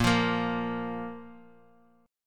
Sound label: A sharp 5th A sharp, Guitar Drop D; x,1,3,3,x,x; down